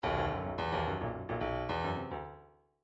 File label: bdf midi file grooves funk r funk r